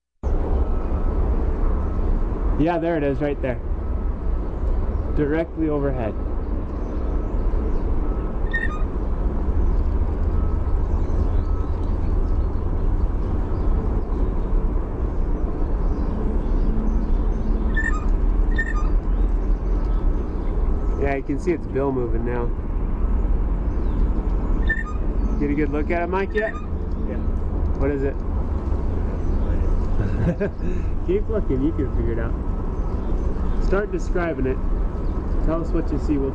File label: BLJA pumphandle, nature sounds